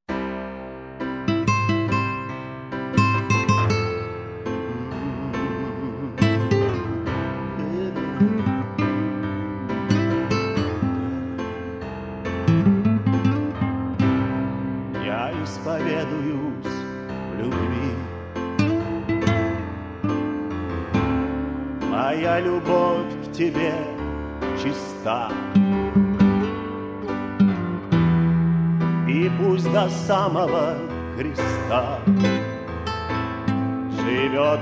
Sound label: blues